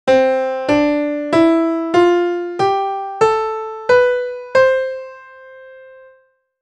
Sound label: C Major Scale